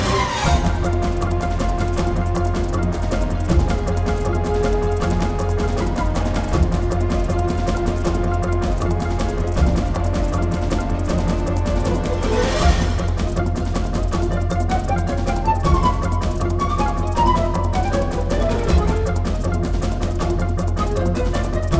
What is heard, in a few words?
Music Ingame Boss Ichthyosaur Loop; Boss 03